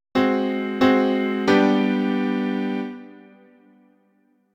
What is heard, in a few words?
Italian sixth moving to V; Sixth chord